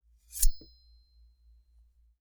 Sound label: Metal; TOOLKIT vol.2; DET24 ElementalMysteries